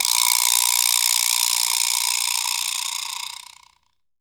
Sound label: vibraslap; AKAI S6000 CD-ROM - Ultimate